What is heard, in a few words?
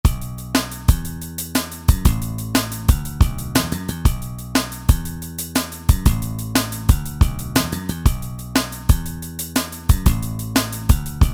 Pop